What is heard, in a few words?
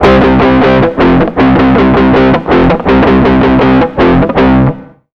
160 H ROCK, Electric & Acoustic Guitar Loops; USB Soundscan vol.04; AKAI